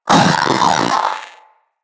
Companion Vocal Bark Double, Pets; Zanuka

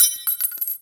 Belt Fed Rifle Links E; Warframe; Tenno; Frumentarius

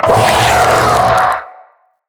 Spider Mother Vocal Attack Short; Vocals; Root Spider Mother

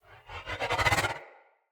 Great Sword Range Rune Charge; Runes

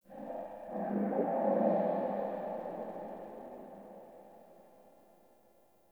Sentient Lair Texture Interior Small, Ambience

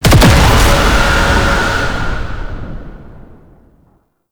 Brimstone Cast Impact; Uriel Brimstone; Warframe

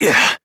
Pain, Adult Operator; New War

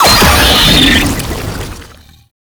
Death, Vocals; Enemies; Warframe; Proto Infested; Brachiolyst

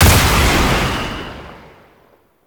Wrist Gun Shot; Weapons; Warframe; Tenno

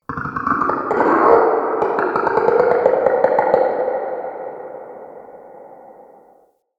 Day Croaky Creature, Ambience

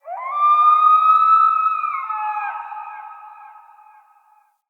Wolf Cub Trapped Call Distant; Animals; Wolf Cubs; Orengal Wolf Cub B

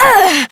Generic Short Pain Operator Voice; Operator Mission

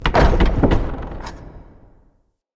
Winch Stop; UnderCity

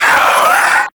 Swarm Vocal, Enemies

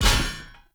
Sphere Polearm Medium Parry Impact; Weapon Impacts; Player; Combat

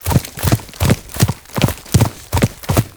Ram Run Loop; Animals; Duviri

